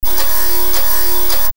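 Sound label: Laser Beam, electronic fx; X-Static Goldmine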